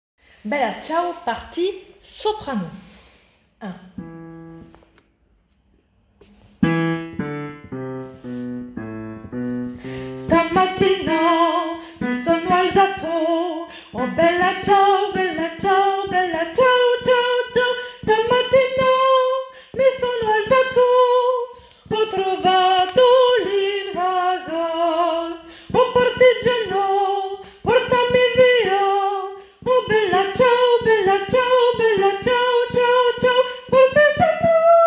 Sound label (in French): partie soprano 1